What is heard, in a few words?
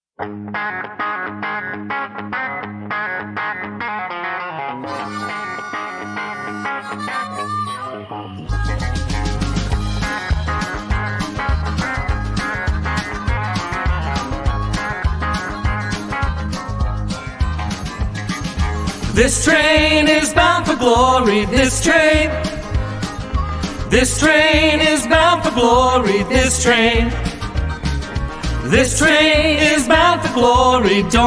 This Train all parts